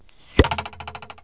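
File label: throw stick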